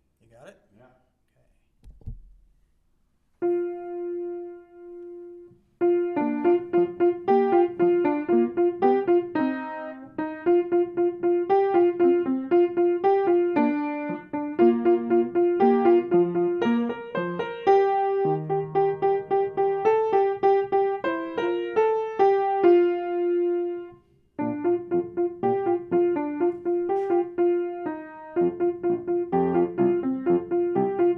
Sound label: piano